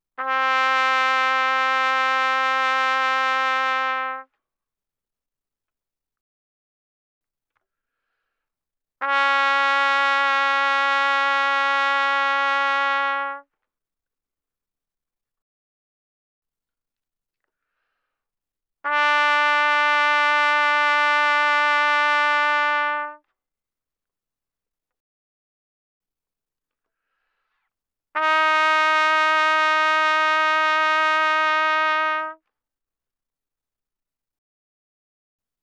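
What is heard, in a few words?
Trumpet vibrato ff C4 B4; Brass; Bbtrumpet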